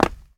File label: stomp stone; ice age scrats nutty adventure